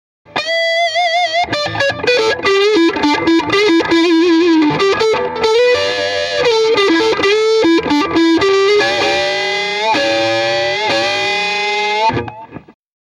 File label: m75 lp jtm45 straight in tone off